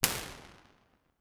St Patricks Church L

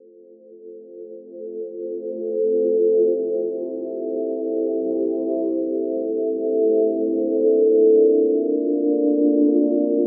Pads Preverb R; Reverse

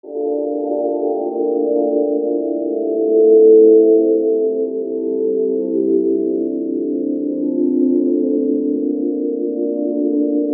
Pads Preverb

